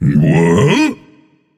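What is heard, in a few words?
non-verbal confused; smite; atlas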